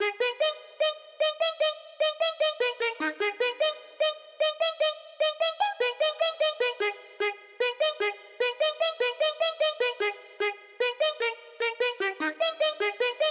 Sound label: melody, 140BPM; Pony Fan Music Remix Stems